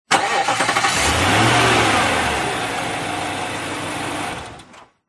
vehicles car nissan patrol 2020 v8 engine start off short front ext